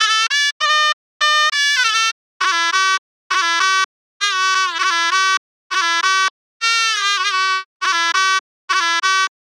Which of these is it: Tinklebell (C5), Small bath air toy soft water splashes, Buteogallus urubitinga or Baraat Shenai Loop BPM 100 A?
Baraat Shenai Loop BPM 100 A